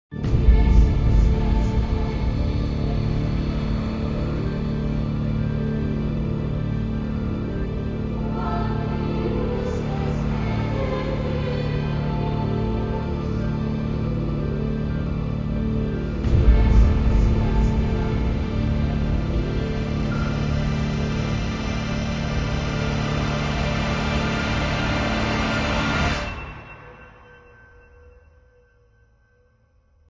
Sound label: 120 Bpm; legrand legacy tale of the fatebounds; Boss Uwil Theme Intro